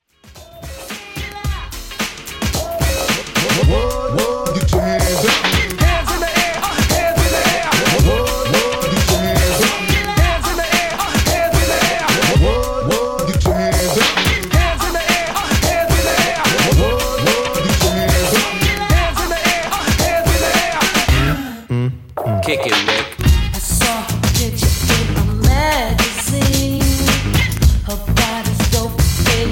80s Party Intro, 110 bpm

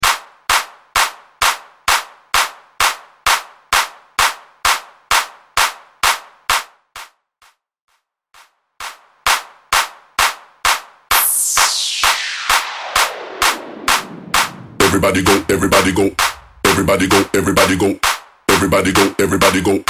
Reggeatton Mainstream Clap A Pella

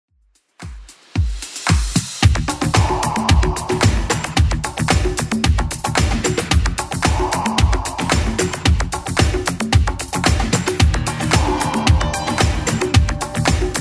80s Club Edit 112bpm

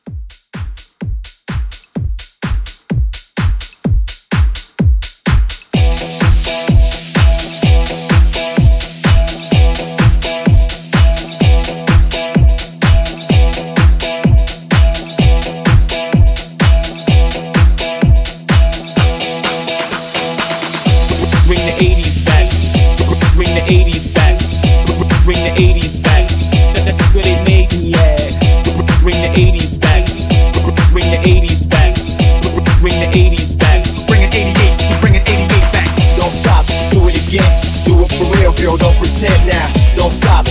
Old School House

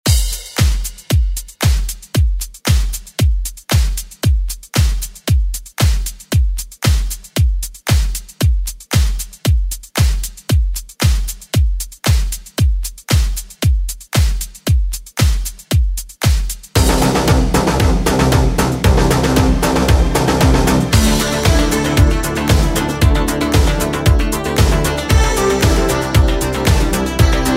80s Re Drum

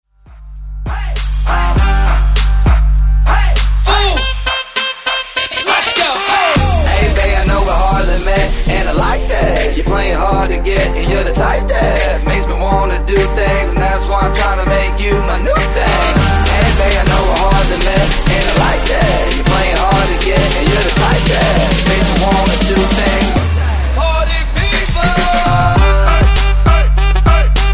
Twerk Remix

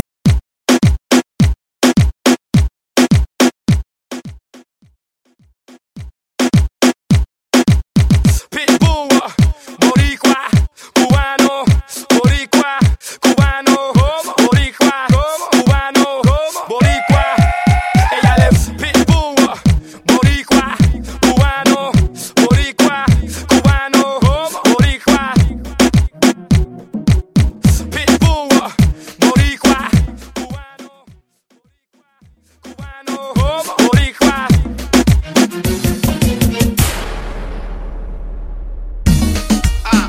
Reggeatton Mainstream

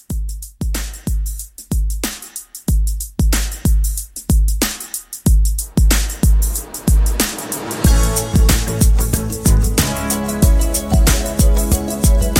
80s Pop Rock Re Drum 93

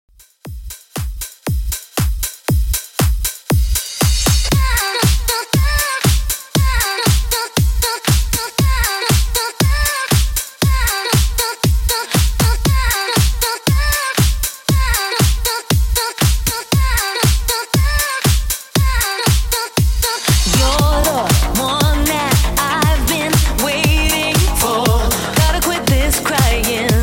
Redrum 118Bpm

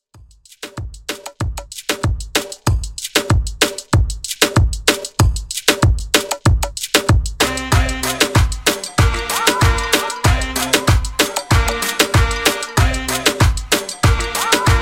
Party Moombah 95 Bpm Clean

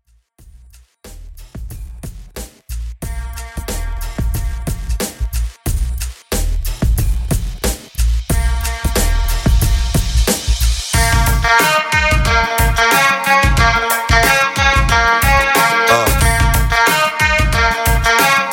Old HipHop Redrum 91Bpm

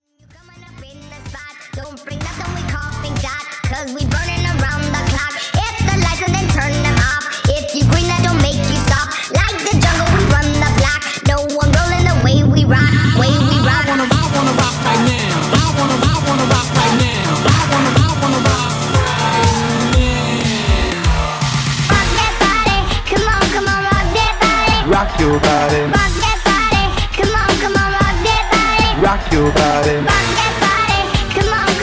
Wordplay 126 108 Bpm

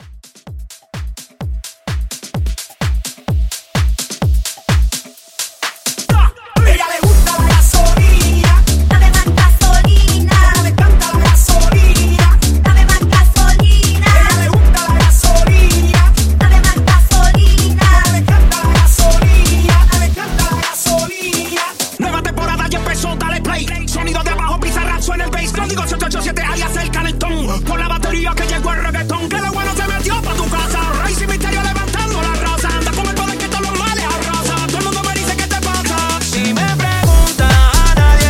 Club Dance, 128

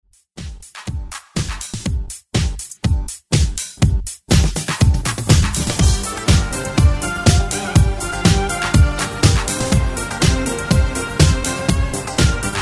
70s, 122 bpm; DJDOLLS

